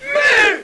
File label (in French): Muuuuuuu, le cri de reconnaissance; Celui là, c'est un morceau de choix, c'est le cri de reconnaissance de notr